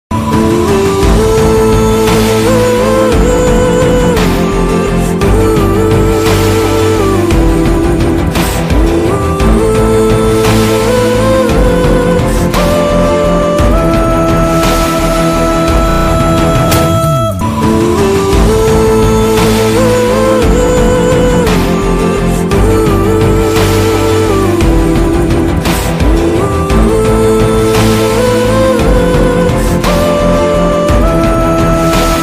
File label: instrumental ringtone